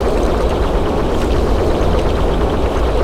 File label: Twirling Lego Beam; ambience nexus tower